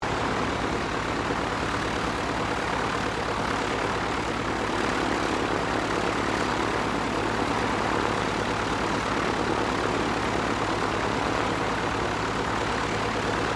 1935 Dehaviland DH-84 Dragon idle, Dehaviland Dragon Biplane; Airplanes